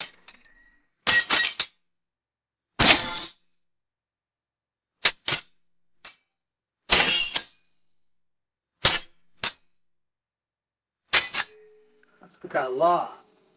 sharp metallic sword blade hits; weapons

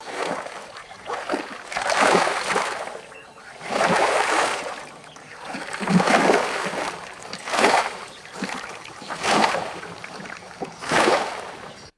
canoe paddling past with distant birdsong

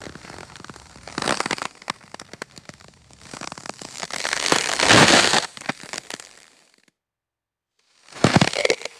low pitched ice crackling and crunching

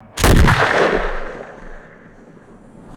heavy sniper rifle gunshot with canyon echo

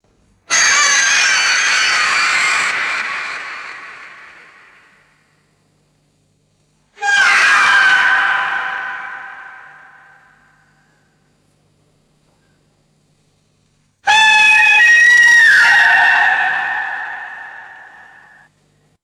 high pitched squeaky air horn blasts; horns

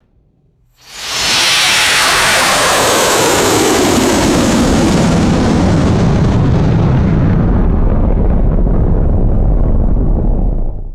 massive sci fi jet pass by; magic-scifi

